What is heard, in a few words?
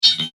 Metal Multimedia Double-Click; Andrew Fleming